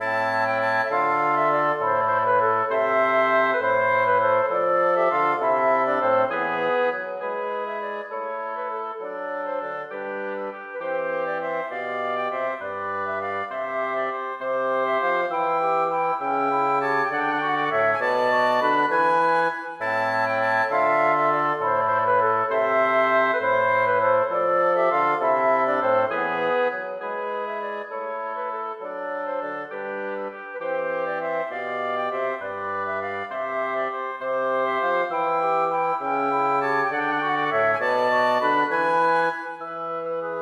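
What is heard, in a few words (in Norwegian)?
Gigue for blåsekvartett uten Cembalo; Hertz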